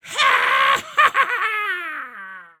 Crazy Orc Laugth Risa Orco Loco

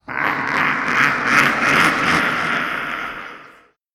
Scary Laugh

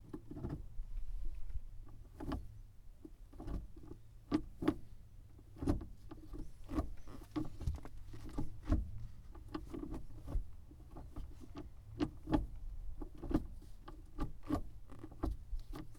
Gear Changes

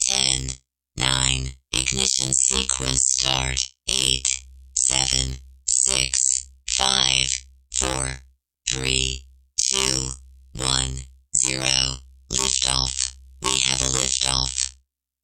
Countdown

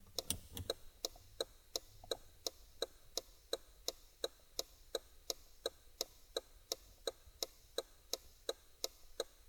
Car Flicker Constant Car Flickering

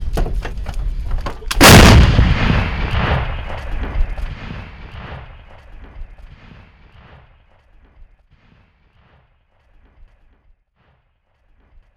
M777 Howitzer

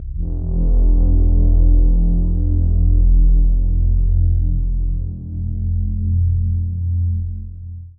Steampipe Ghostwhistle E2

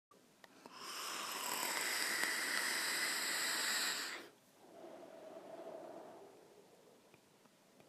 Better Vape Inhale Consolidated

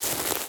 Footstep Grass

